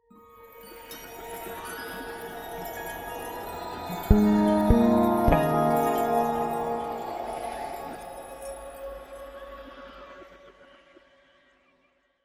Magical Chimes and Wind Into Melancholy Synth Chord